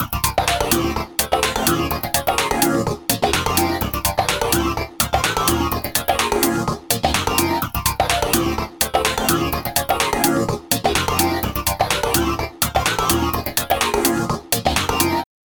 The gate effect comes to life.; You should hear the sound 'flashing' on and off in time with your trigger sound.; The gates parameters are set.; programming rhythmical gates in logic

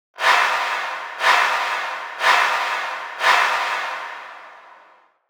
Both gates together.; auto panning effect; noise gate

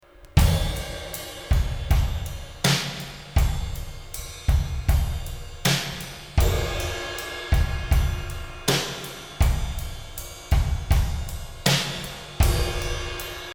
drum rev no eq, Too much low end reverb; kick drum

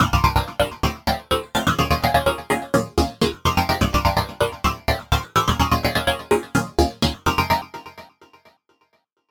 … and the gated effect in isolation.; Logic

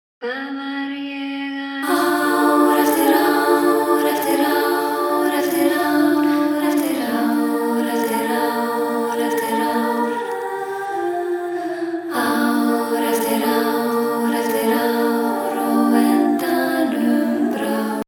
backing vocals solo

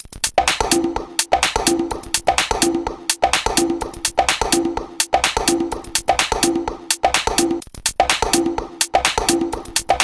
The raw percussion loop.; The percussion loop we'll use to trigger the gate.; programming rhythmical gates in logic

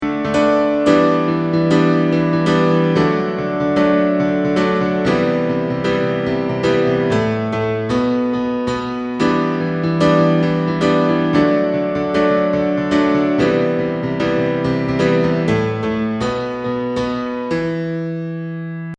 piano wet, Piano without EQ; reverb

